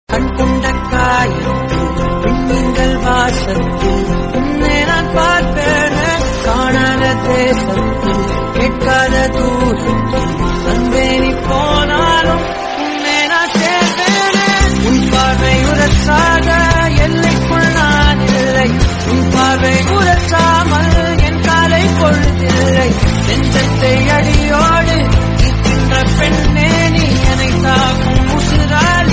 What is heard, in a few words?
Song Tamil